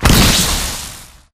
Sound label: electrical death zap; Titanfall 2